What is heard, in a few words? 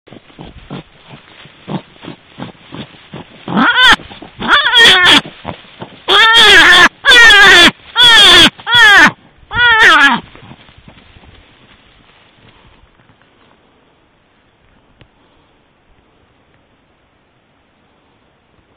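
scream of a wounded hare; zvuki zaytsa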